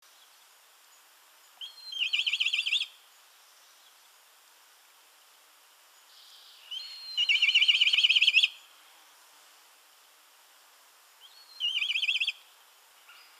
Milvus migrans; aves

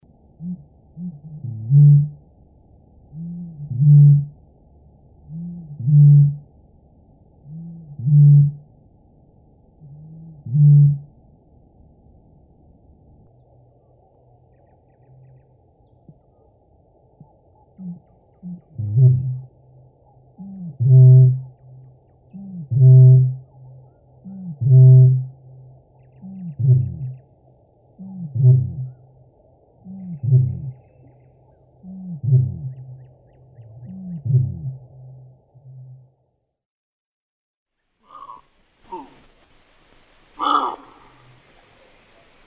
Botaurus stellaris; Голос; aves

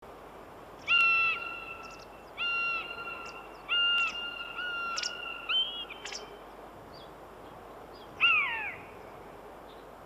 Buteo buteo; aves

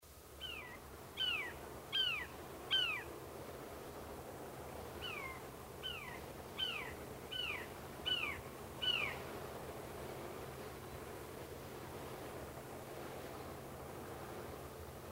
Accipiter nisus; aves

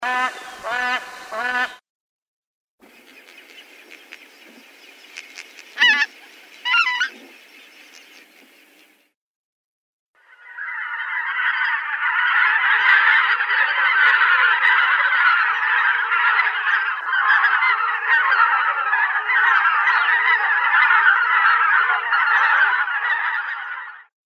Anser albifrons; Голос; aves

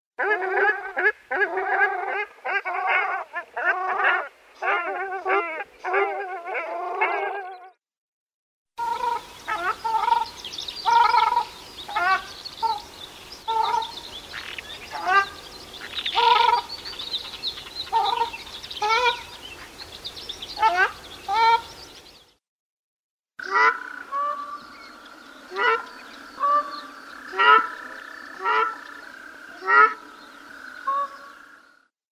Tadorna ferruginea; aves

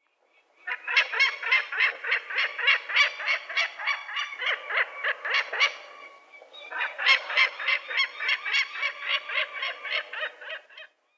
Falco peregrinus; Голос; aves

